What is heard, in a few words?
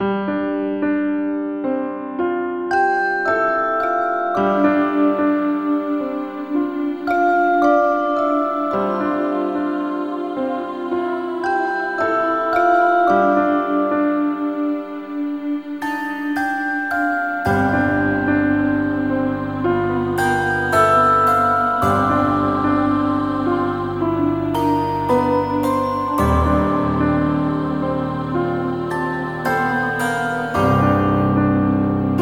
Musicbox